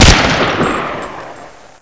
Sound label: remington; zmall